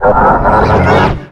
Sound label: pokemon cry